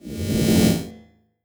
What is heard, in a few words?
teleport